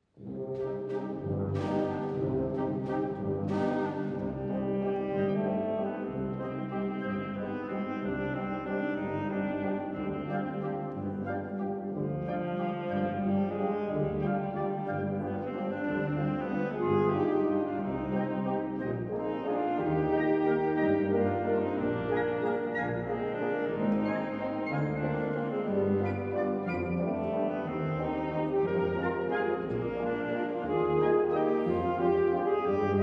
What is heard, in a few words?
Lyric Waltz